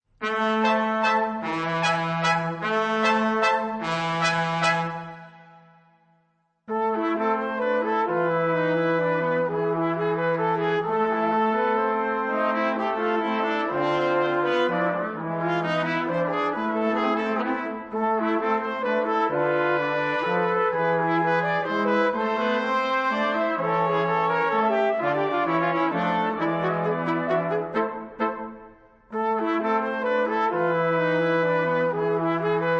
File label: mazurka